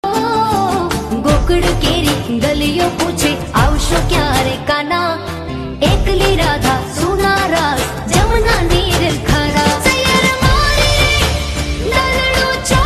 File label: navratri garba gujarati garba; couple garba; garba lover